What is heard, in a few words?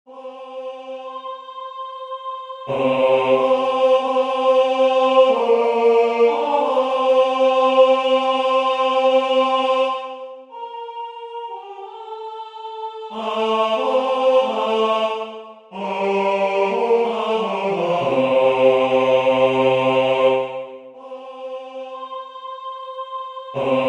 tenor; Waiheke Island Choral Society